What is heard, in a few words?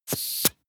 vent air release short